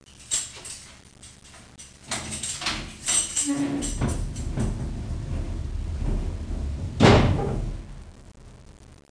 cell, police; disaster

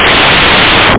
clap

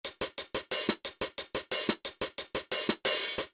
Hi-hat

